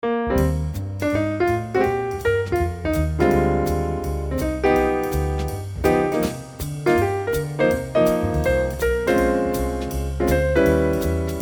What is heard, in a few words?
One Man Band, Piano